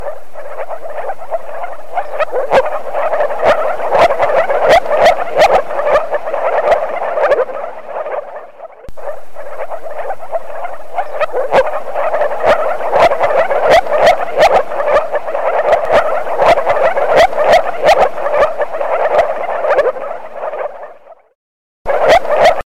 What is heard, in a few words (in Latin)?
Rana arvalis